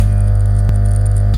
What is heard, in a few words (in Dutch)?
laadklep